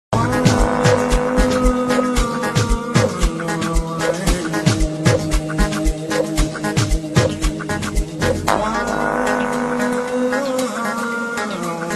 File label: Sax